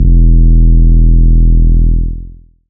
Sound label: Trap bass, EDM